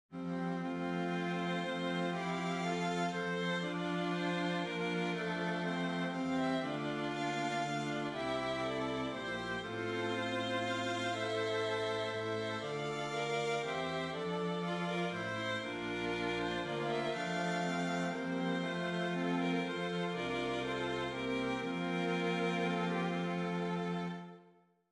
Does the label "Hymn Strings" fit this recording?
Yes